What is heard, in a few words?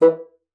bassoon, E3; Xsample VOL-6